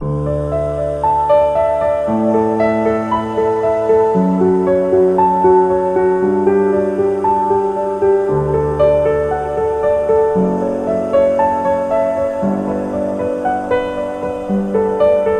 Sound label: Inspiring Positive Piano; planetarium